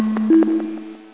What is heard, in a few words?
doop